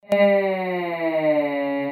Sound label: mummy ehh long